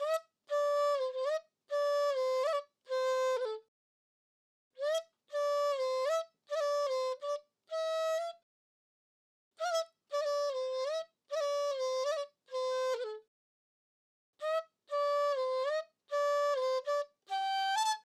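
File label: Trap Chinese Flute C major 100 BPM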